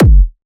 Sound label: Techno, Kick; Techno Sounds 2024